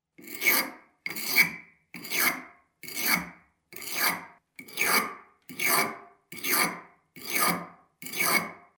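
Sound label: knife sharpening sound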